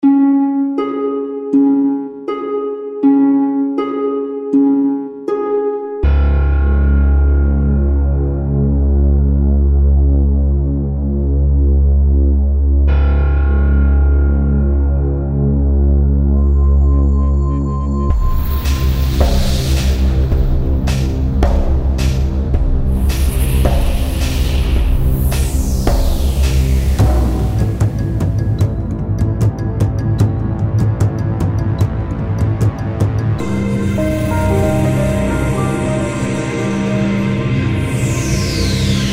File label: dark moods; halloween